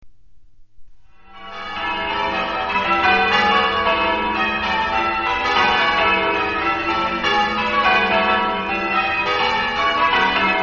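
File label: ringers quarter peal, old bells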